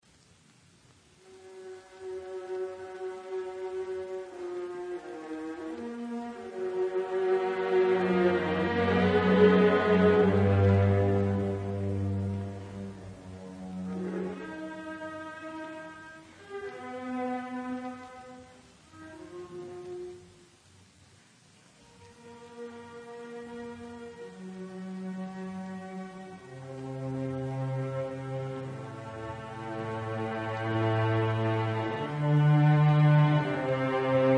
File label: String Quartet in F sharp minor, orch., Sehr rasch; Schoenberg; String Quartet No. 2; Op. 10